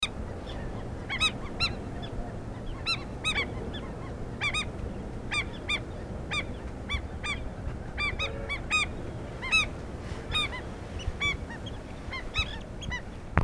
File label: Indian Skimmer Rynchops albicollis